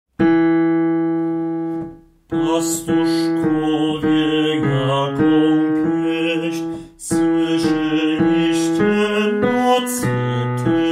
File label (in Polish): BAS; Nad Betlejem